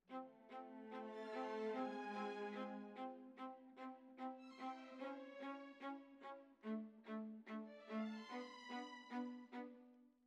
Violas, jazz for piano

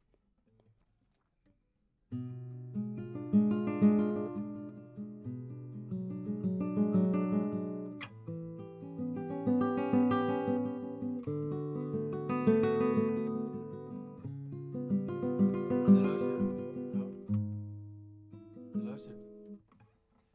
GTR

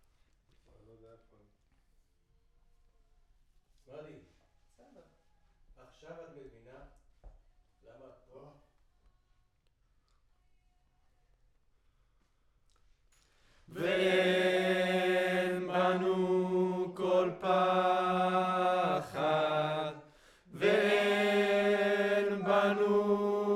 Choir

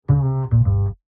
Bass, Jazz for piano

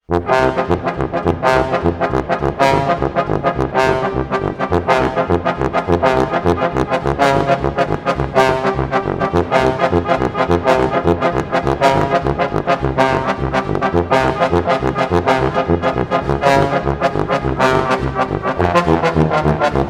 Brass, Jazz for piano

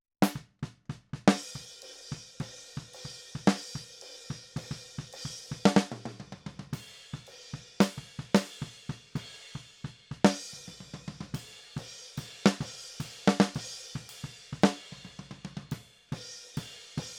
Snare

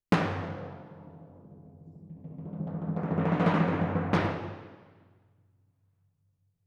Twin F Timp

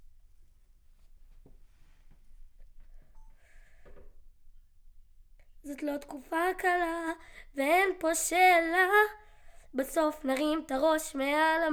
Children Choir; Studio Bekol Children Choir

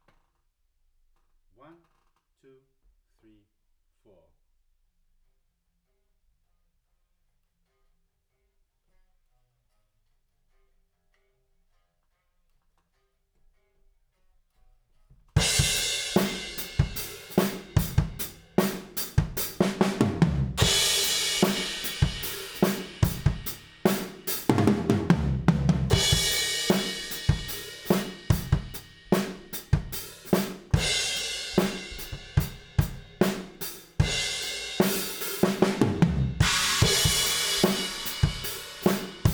Room

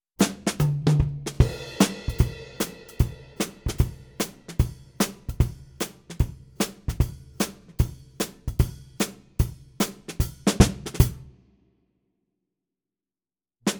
Ragtime drums

